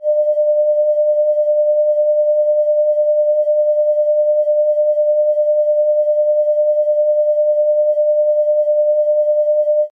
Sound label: tone